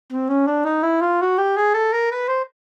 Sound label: Cartoon ascend